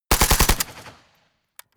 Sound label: rifle ak-47 exterior fire burst close; Firearms; schusswaffen